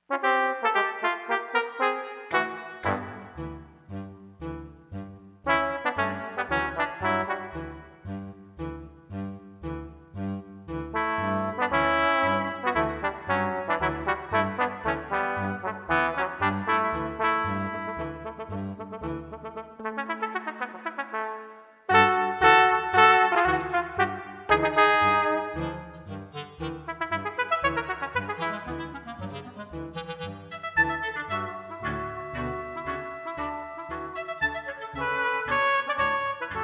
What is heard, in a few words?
Soldier March